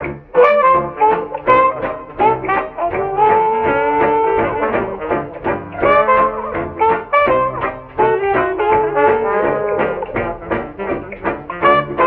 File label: horns, phonky loops